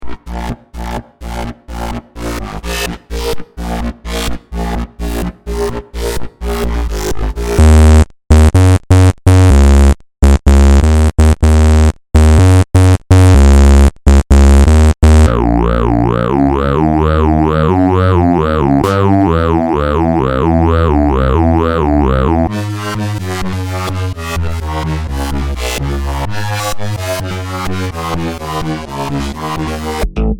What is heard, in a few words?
SYNTH, synthesiser bass loops; bold low-end